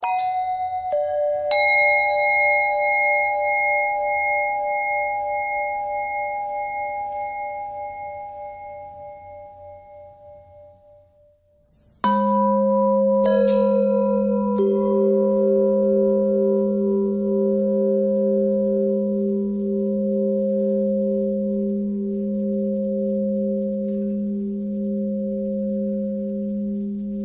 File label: bell long bell thread pentatonic